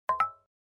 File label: Double Tone; ACE Clever-1